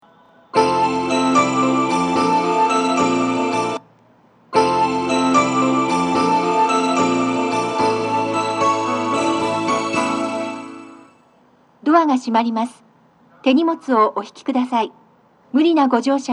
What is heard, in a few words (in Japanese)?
hasyya, 発車サイン音; 1番線 西船橋・東葉勝田台・津田沼方面; 両面箱型; 南砂町